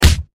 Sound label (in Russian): poshechina; za nedostojnoe povedenie